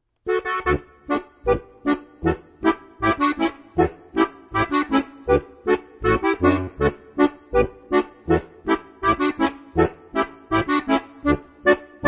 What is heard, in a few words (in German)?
Schottischschlag